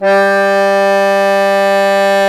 Bass Accord; Club-50 - Foundations Roland; Accordians

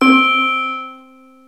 METAL BELL, PEAVEY DPM; OTHER SYNTHS; Rubber Chicken Software Sample Bank

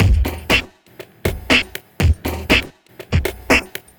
housephunk, beats; Sonic Foundry (Sony Creative Software); Ugly Remnants VOL-2

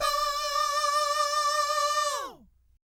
VIB FX, Vocal Planet Jazz & FX; ILIO; JAZZCOMBO

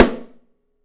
Drums, MARCHING; 3D Drums; OP MARCHING

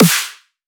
Arcade Blaster Snare

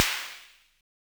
Snare, Univox SR-95

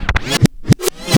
backscratch, scratches; Zero-G; Freak Beats

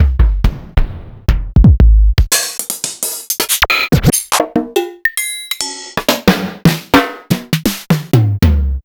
Linn AdrenaLinn

